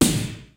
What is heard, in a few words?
FUNK, DRUMS; Kid Nepro; Elite